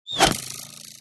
bow hit; Minecraft

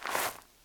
gravel wander, step

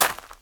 gravel walk

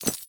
crystal run, step; blocks

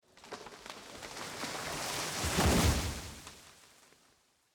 tree fall; ambient; minecraft; Remastered

